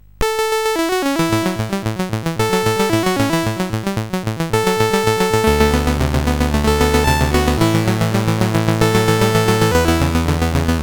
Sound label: CSG8580R5, GAMES; Street Rod